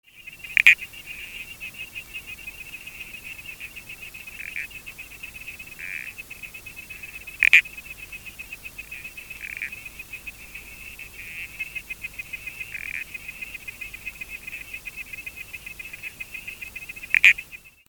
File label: semimarmorata, frogs